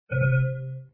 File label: Windows XP Hardware Fail